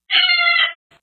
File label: Wink Cry